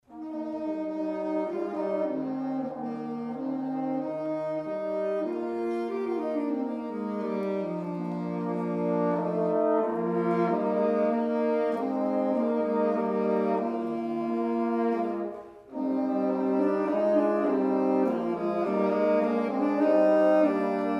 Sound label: instrumental